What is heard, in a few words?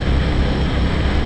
tank; MAX